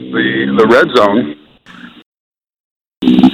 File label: farts